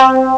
cling; Amiga Soundtracker Sample Packs

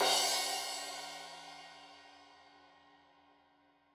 Crash 15in L edge, Cymbals Stereo

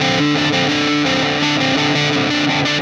Static FX Guitar

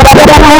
FX GTR, GUITARS KIT

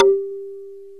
BOTL MARIMBA; OMI - Universe of Sounds

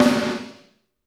FLAM GATE, PROC SNRS, Drumworks; Sampleheads; New York City Drumworks VOL-1

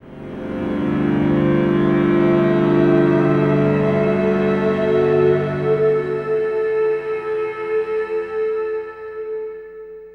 Elektro Mass, Elektrik Drone, Sky Church; Sonic Foundry (Sony Creative Software) - Friendly Fire; DroneLoop

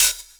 Open Hhat, Hihat; Equipped Music; Loungin House

